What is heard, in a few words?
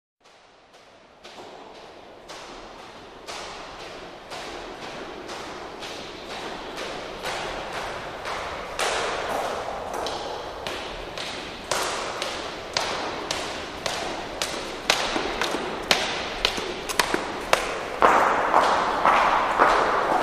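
Footsteps By Echoey Stairwell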